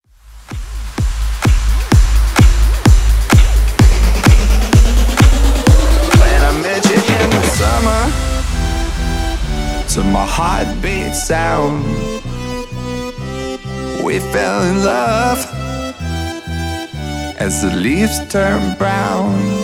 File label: 128 Bpm, progressive